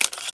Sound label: clip out; weapons